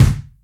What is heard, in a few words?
sharp kick drum sample c sharp key